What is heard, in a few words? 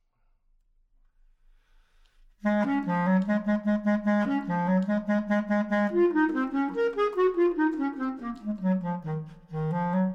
bass clarinet practice